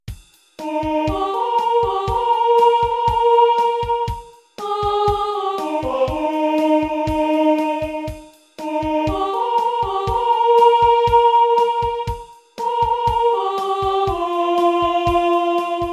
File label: short simple alto